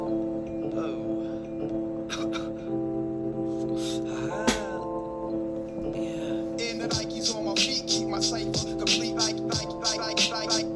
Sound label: hiphop